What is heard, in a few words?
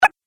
Jump Video Game